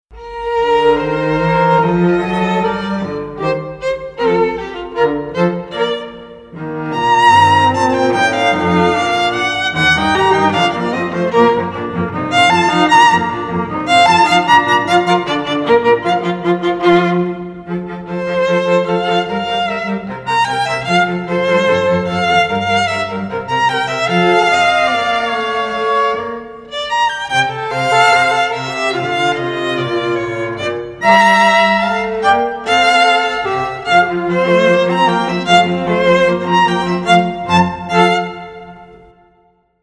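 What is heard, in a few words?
Divertimento for Violin Viola and Cello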